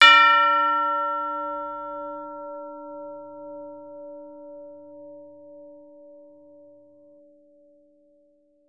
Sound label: TBL LG A#4, orchestra; Advanced Orchestra